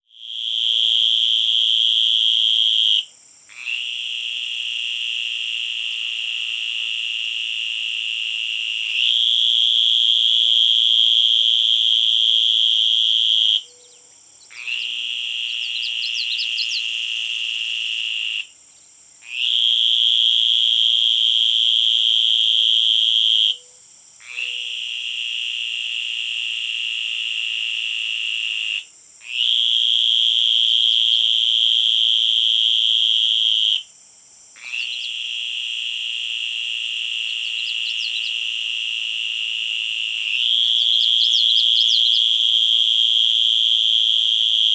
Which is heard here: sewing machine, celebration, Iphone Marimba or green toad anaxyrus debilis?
green toad anaxyrus debilis